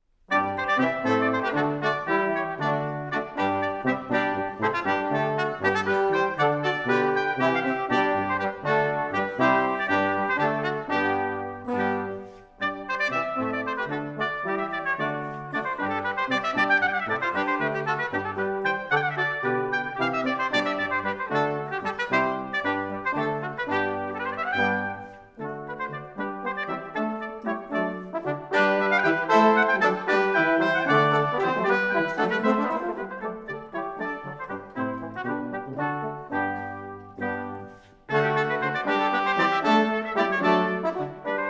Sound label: brass